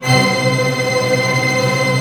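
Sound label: string tremolo F#; Sound On Sound - Peter Siedleczek's Orchestra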